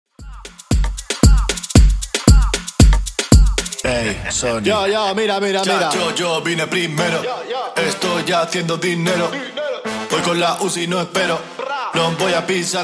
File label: Dj Intro Outro 115Bpm